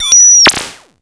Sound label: SLAP BELL; Zero-G Ambient 1; ANABELL PERC